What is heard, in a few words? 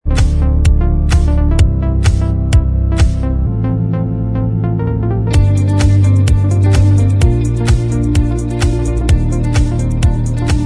Inst Track, backing tracks